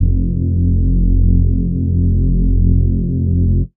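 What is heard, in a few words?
bass sustain wide F; Polyend; ARC Noise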